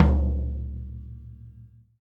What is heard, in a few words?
Tom Floor Soft; hydrogen drum samples